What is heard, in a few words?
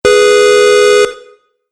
Car Horn Long; Car Sound Effects